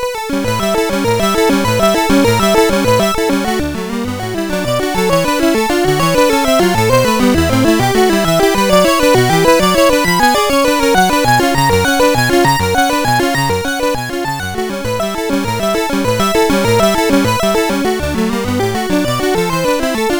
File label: Prelude in D Major, MOS6581R4; Op28 No5